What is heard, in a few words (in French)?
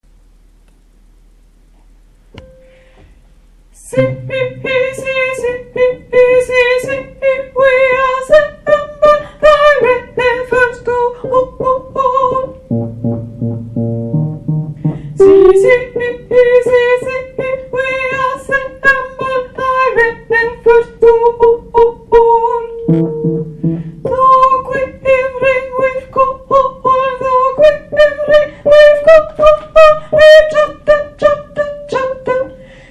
choeur tenors; choeur du froid